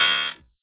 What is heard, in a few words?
error